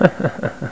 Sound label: GUFFAW; HUMOR